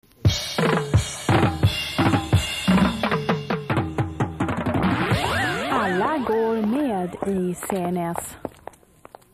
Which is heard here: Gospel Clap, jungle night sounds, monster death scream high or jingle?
jingle